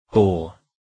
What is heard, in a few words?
boar